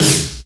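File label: FLASH snare drum; Best Service Hyper Dance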